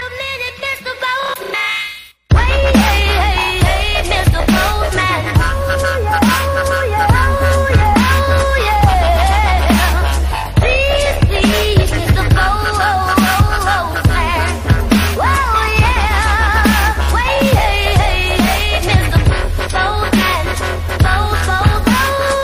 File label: dubstep; electronic